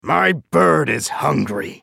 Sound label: attack; Swain; en US